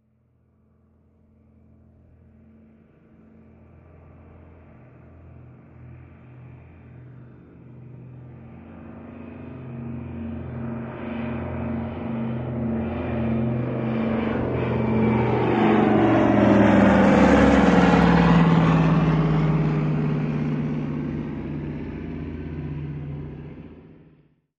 Prop Airplane By, Dc-3, Long Approach, Medium By with S; Prop Airplane By, Dc-3, Long Approach, Medium By with Short Away.; AIRCRAFT PROP DOUGLAS DC-3